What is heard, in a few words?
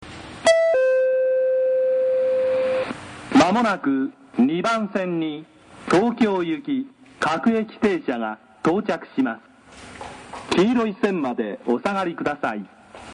sekkin; kemigawahama